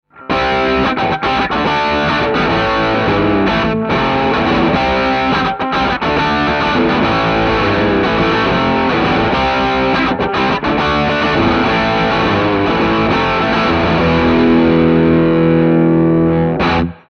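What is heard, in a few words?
SSL SiX CH Shure SM7B Electric Guitar Chords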